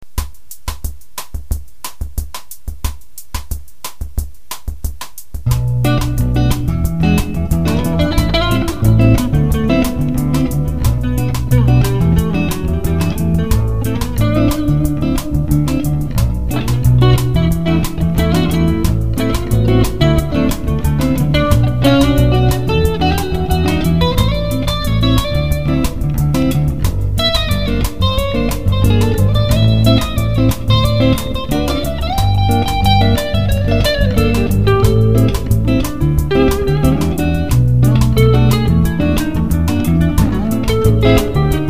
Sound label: bossa